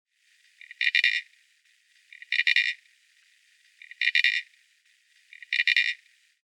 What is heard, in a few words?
Underwater Sound Paddle Crab